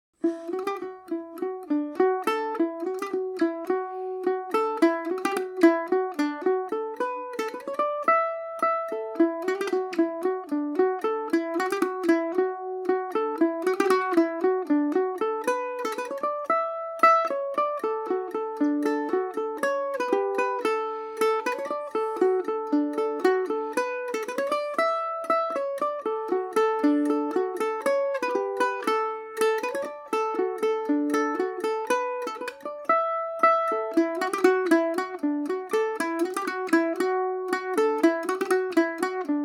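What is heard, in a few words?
polka E Dorian, Irish mandolin; The Ballyvourney Polka; Paris Swing Macaferri mandolin using a d’ADDARIO “CHRIS THILE SIGNATURE” MANDOLIN PLECTRUM (1.4mm)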